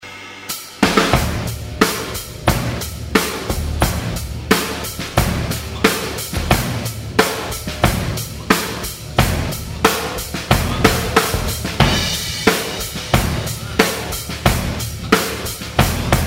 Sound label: Drum outtakes